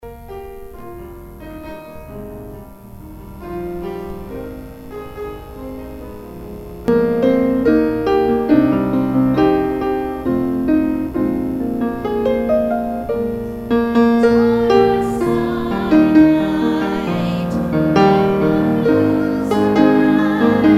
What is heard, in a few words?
hymn; 'It was on a starry night